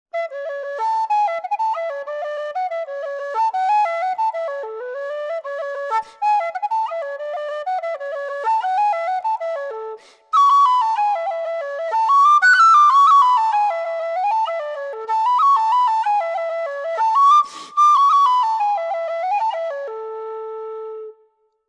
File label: Carbony Mezzo in A